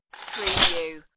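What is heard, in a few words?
Tape Measure In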